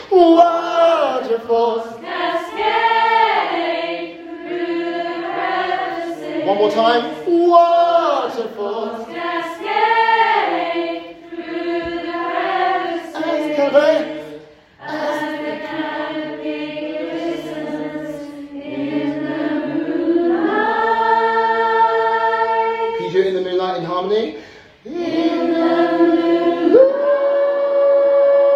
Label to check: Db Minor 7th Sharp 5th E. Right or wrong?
Wrong